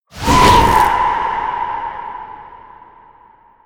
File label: Corrupted Void Ghost Drain Aura Start; Warframe; Zariman